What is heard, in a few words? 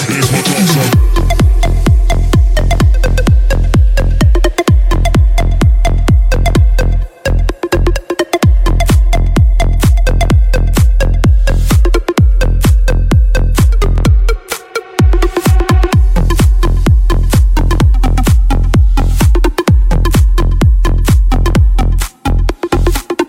Bass Electro